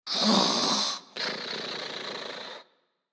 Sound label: Dog Sleeping; Prison Architect